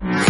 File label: Minimal Perc; Minimal Percussion